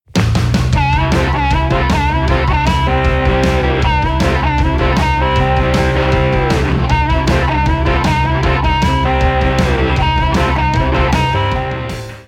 Double Lucky rhythm

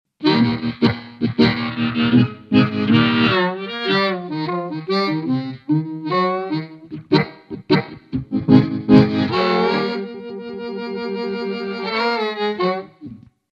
Low Octave; DigitechRP; RP200

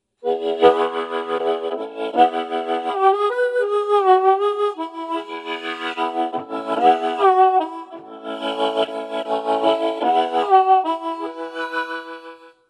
545SD Rotary

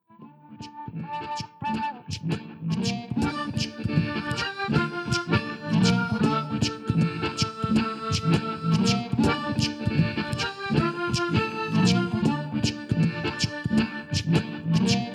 Full band in mix

